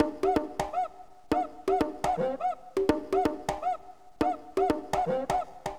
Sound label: bong cuica; recreational research foundation; drum